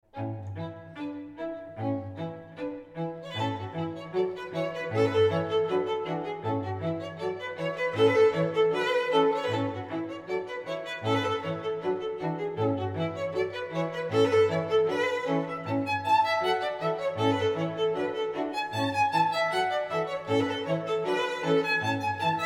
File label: trad. Irish, string trio; string quartet; Molly on The Shore